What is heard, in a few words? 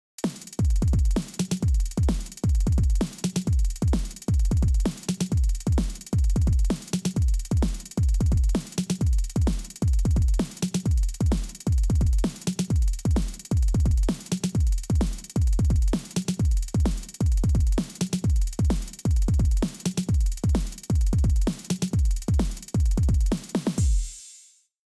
HipHop; MDrummer